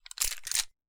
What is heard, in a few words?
ar slide